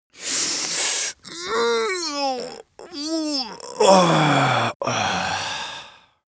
male stretch; menschen; koerpergeraeusche

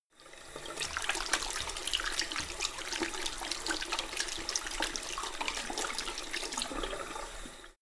sink wash hands soft; haus garten; badezimmer

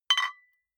flip-top bottles clink multiple; berufe

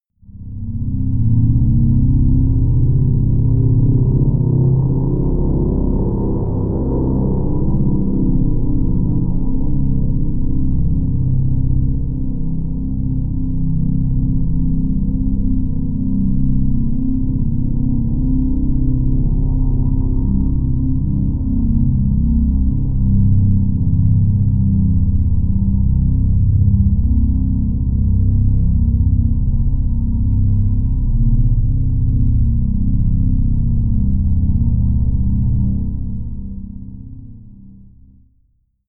drone dark hero

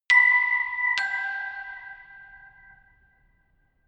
notification glockenspiel creepy third down

